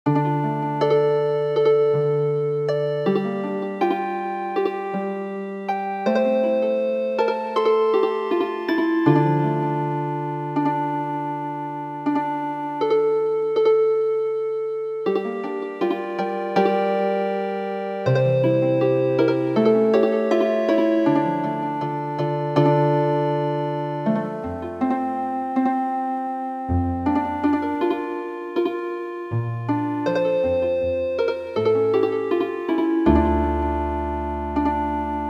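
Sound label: Healing Harp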